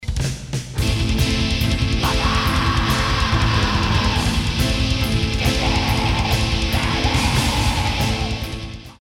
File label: piano riff